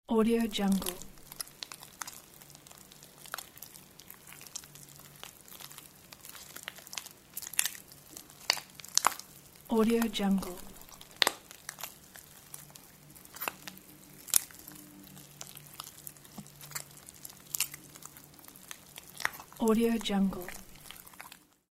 viscous slime

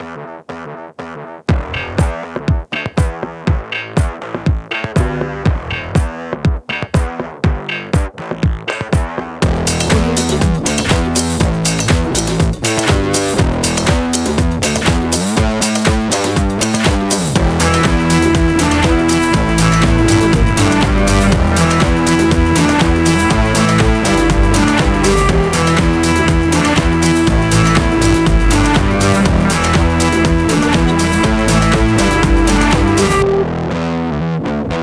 energetic fun fashion sport upbeat

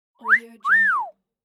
wolf whistle

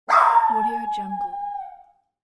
small dog howling

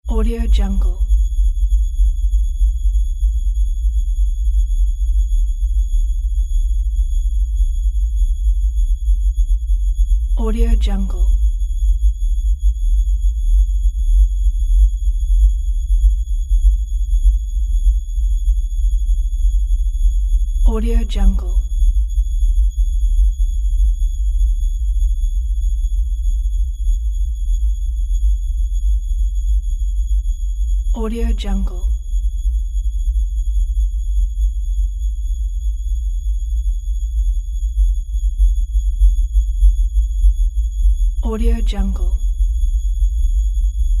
squeak and hum

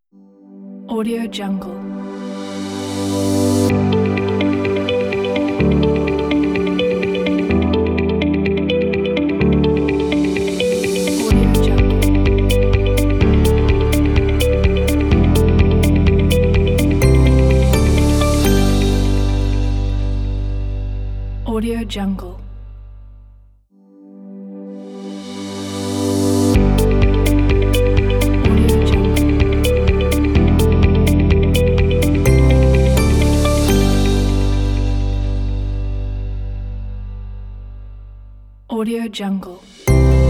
motivational corporate logo

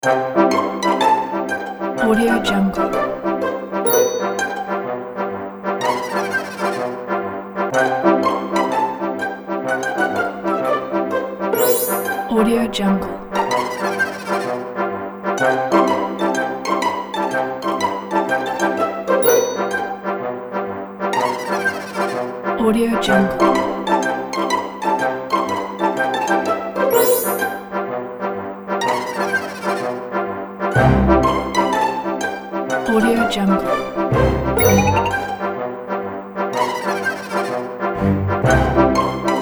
quirky

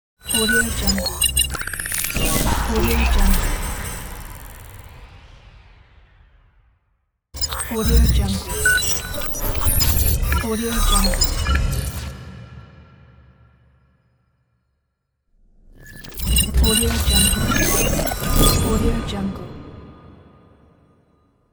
glitch distortion

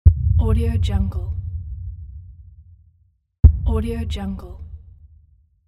ultra low impact

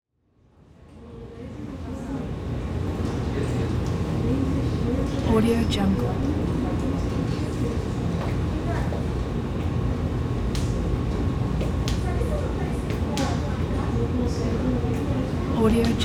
calm lobby ambience

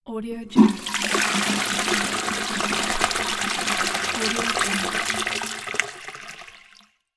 toilet flush

